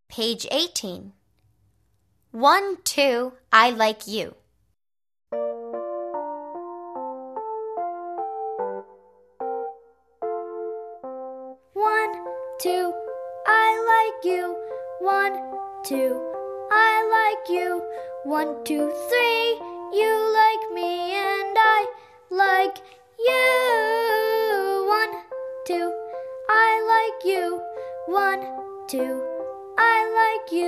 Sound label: One two I like you holiday jazz chant